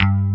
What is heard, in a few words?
PICK BS, JV 1080; Pro-Rec - Synthsonic